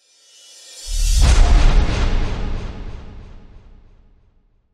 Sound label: Hit Suction Slam, Reverb, Accent, Transition; Production Element, Imaging Element